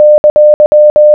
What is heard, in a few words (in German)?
rufzeichen, morse